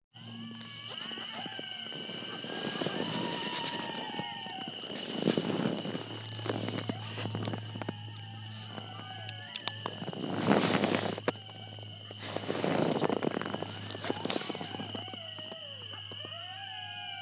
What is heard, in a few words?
coyotes